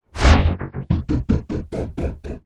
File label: Digital Transition